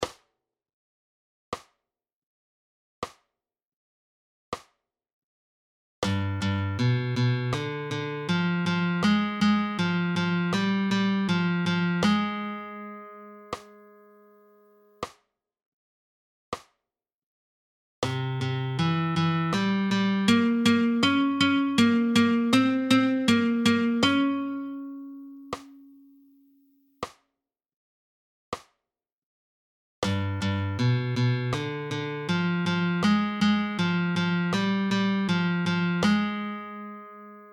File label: Alzapua